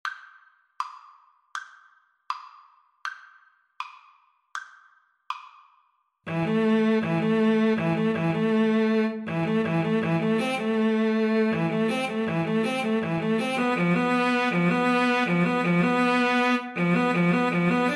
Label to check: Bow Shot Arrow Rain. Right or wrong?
Wrong